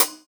Closed Hat, JUNGLE BREAKS

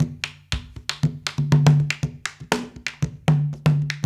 Haitian Congo, World Dance

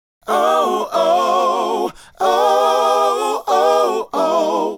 Male Group, Hip-Hop and RnB Vocals

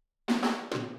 Dancehall 100, Intro, Room, Drums; Sonic Foundry (Sony Creative Software); Drums from the Big Room The Mixes; Demo Projects

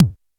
Roland U110 Elec Drums C1

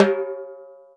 timbal hi; E-MU Producer Series Vol. 7 – Old World Instruments

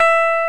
Electric Pianos

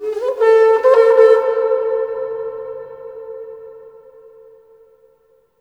HRNPHR, Heart of Africa; AMB. HRN FX

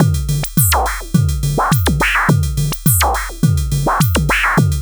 SYSTEM 100; AMG - Vince Clarke Lucky Bastard

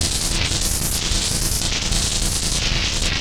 Atonaloop, Industrial Analog

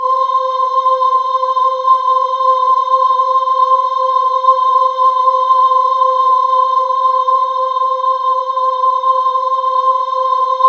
C5, Synth Leads

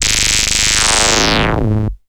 Monotron Dirty Downer

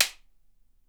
WHIP; Miroslav Vitous - Percussion & Harp

